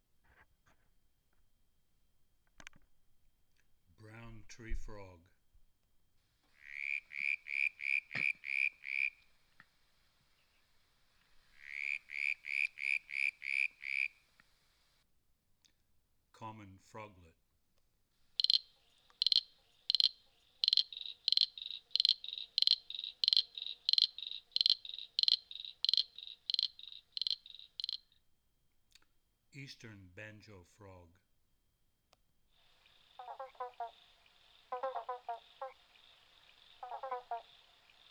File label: Tasmanian Frogs Calls